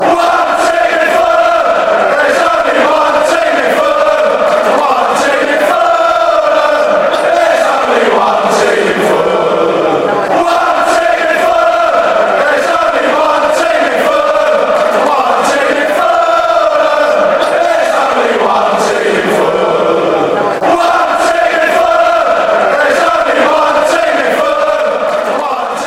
theres only one team in fanchants

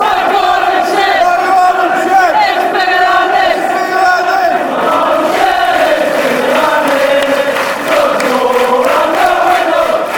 my garden shed fanchants

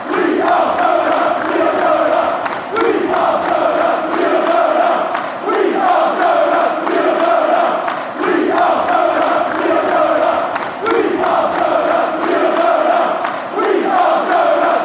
we are going southampton fanchants

